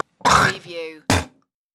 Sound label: Spit Lugey